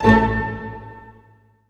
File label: STAC A F, ORCH STAC; Sound On Sound - Peter Siedleczek's Orchestra